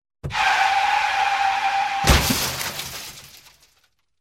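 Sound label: sounds of car brakes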